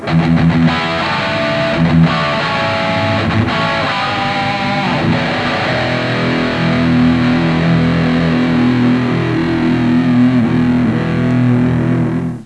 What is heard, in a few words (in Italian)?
suonerie musica rock